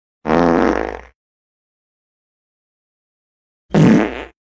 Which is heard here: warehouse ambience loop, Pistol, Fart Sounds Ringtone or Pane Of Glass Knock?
Fart Sounds Ringtone